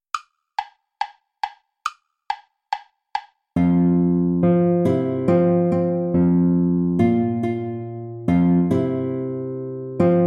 play along without guitar; praetorius michael ballet

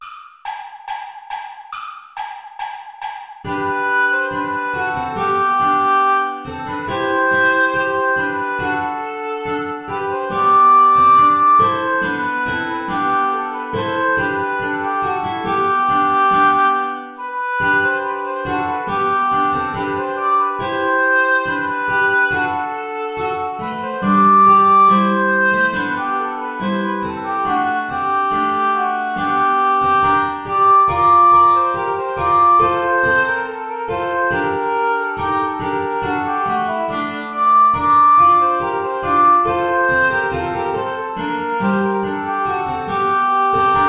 ballet play along without guitar; praetorius michael

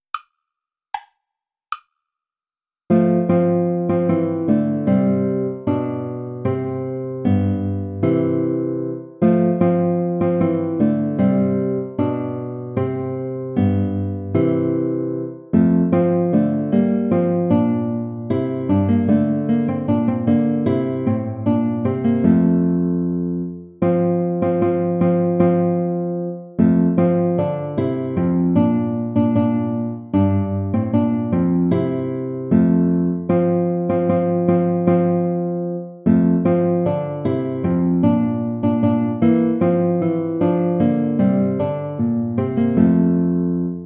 bransle gentil play along without guitar; praetorius michael

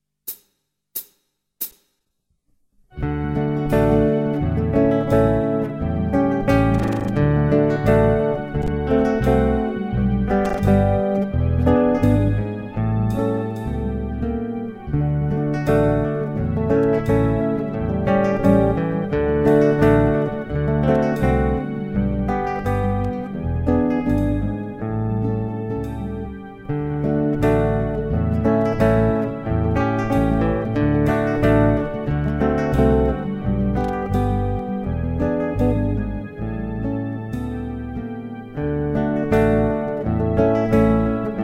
polka

